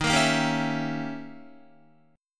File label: Eb 7th Flat 9th, Eb, Guitar; Eb7b9 strummed; JGuitar